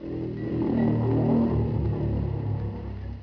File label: g chime; quake2; coop